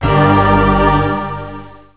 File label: Film Score, Cisco Unified IP Phone 7945G